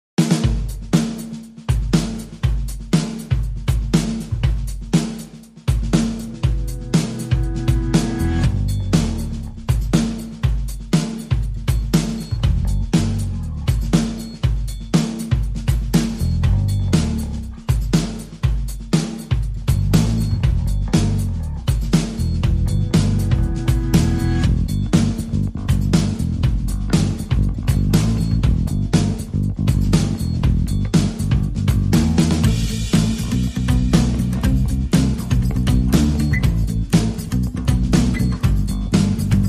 Blowing Leaves, No Lead Reduced Guitars

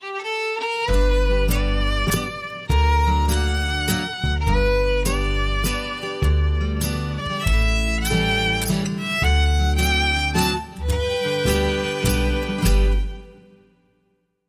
Petticoat Waltz

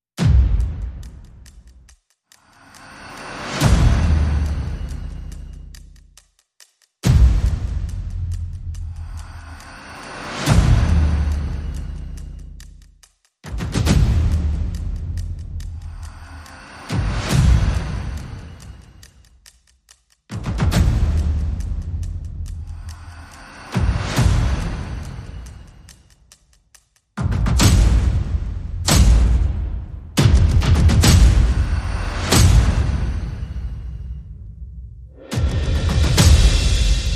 Perc and FX

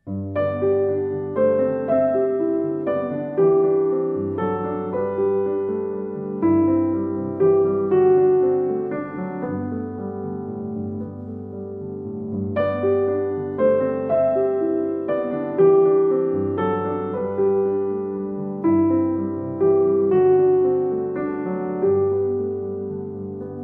Solo Piano

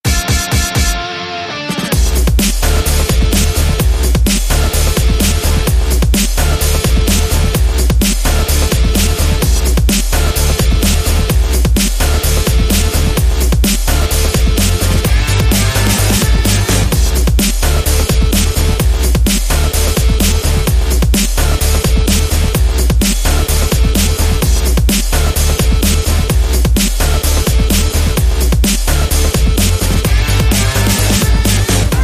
Guitar On Fire Full

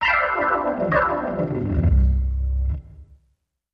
Organ Gliss Down

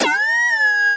Droid

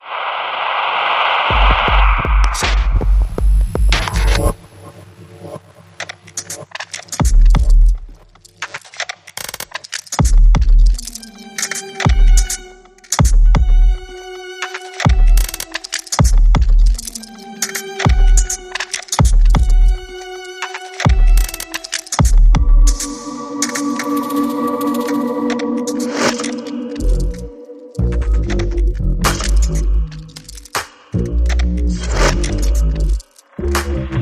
Crackling Strings

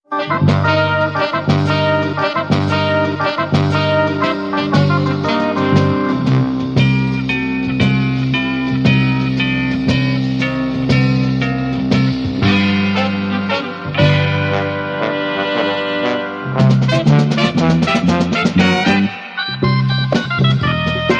Swingin

